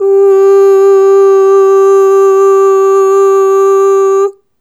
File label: Ooh Long G3 Dry; Harmonic Building Blocks